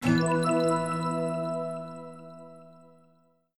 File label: puzzle solved